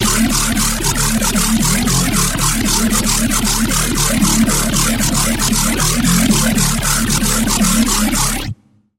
Bass, Serum 2; Chaos Dimension; Routed Sound; Serum 2 Expansion - Chaos Bringer Vol.1

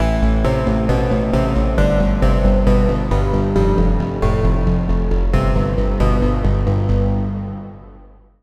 Pluck; Routed Sound; Spire Expansion - Reality Maker Vol.1

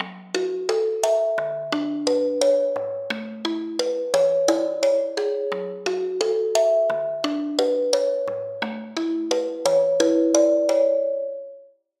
Bell; Routed Sound; Serum 2 Expansion - Story Walker Vol.1

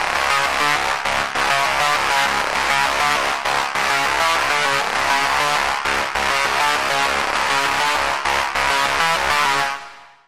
Lead, Sylenth1; Routed Sound; Sylenth1 Expansion - Doom Carpenter Vol.1

